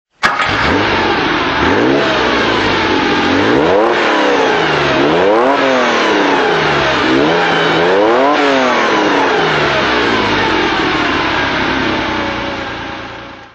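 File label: porsche 911 2.0 s throttle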